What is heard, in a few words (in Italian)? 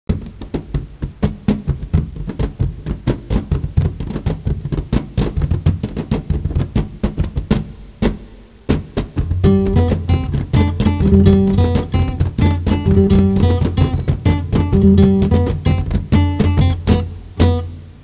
musica strumentale